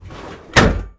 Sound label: Vehicle Hatches; Red Orchestra Ostfront 41-45